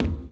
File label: footstep run steel hollow